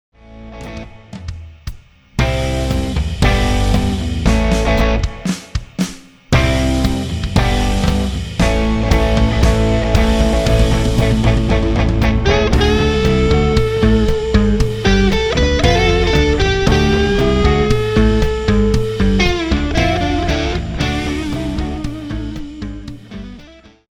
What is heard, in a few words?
No Vocals